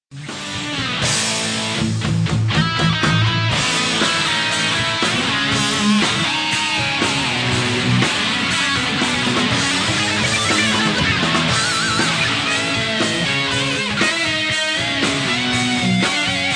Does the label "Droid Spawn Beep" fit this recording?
No